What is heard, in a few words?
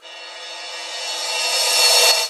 Cymbals Reverse; Vengeance Samples